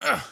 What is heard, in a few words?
Hurt